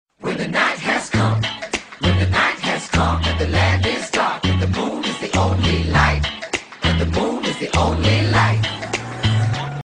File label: one msg tone ringtone